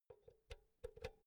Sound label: power cord insert soft; objekte; elektronik